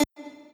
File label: lead d